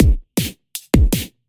Drum Bass Synth Drum Loop 160 Bpm

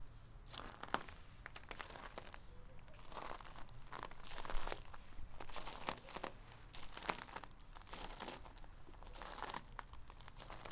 Tiptoeing On Gravel

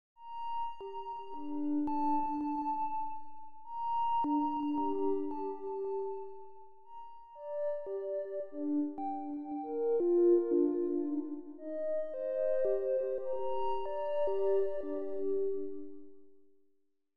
Flute B2

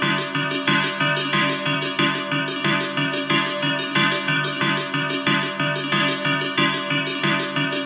Xmas Bells Loop

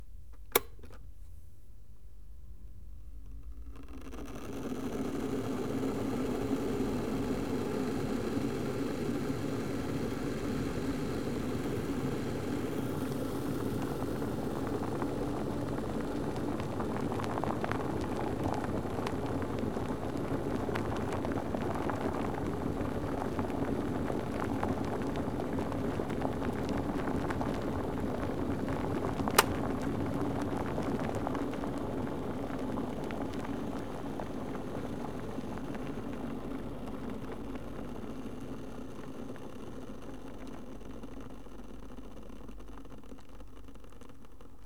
Kettle Boiling Then Stop

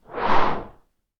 Woosh Metal Skimmer Slowed

